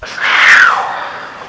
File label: roar, woods paranormal; EVP; recorded at the Ben Lomond Hotel; on a tour we gave for a Television show